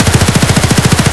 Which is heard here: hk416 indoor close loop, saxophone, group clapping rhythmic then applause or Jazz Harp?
hk416 indoor close loop